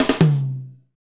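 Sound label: beats funk, Roland; Northstar - Drumscapes Roland; Uptempo Funk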